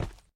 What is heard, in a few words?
Gravel; Lethal Company